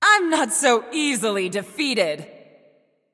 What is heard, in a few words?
Health Low; Bellona